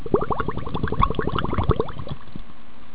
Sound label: blub